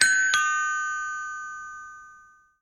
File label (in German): Klingel ding dong; Pfugstrasse 16 Schhlieren